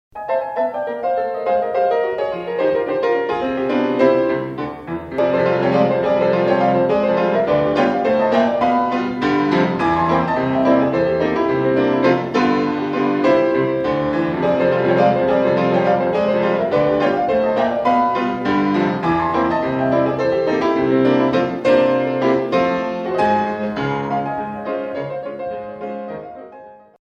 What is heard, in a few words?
ragtime